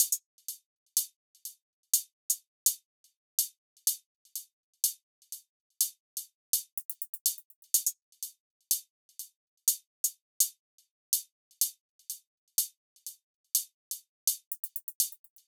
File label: Drum Loop 124 BPM Hihat; Cymatics; Vibes II Drum Loop Collection; Aftermath